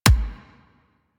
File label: Weapon Punch Pistol